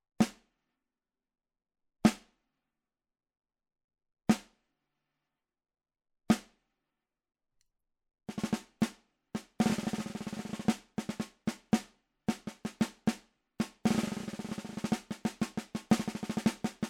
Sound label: Snare; Recenze SE 5